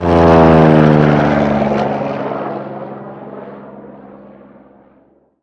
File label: damage hits explosions; World Of Warships